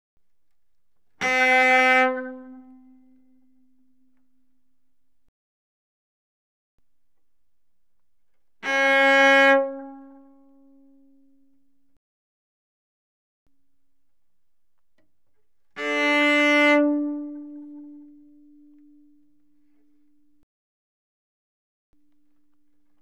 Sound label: Cello arco ff sul A C4B4; Strings